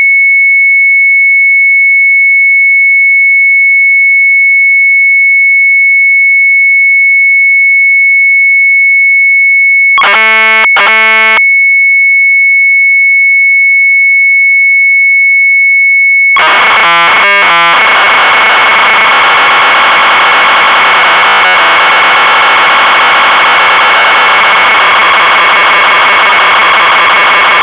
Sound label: MZ800 Tape MUSIC; Sharp